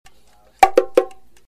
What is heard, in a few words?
Bongo Loop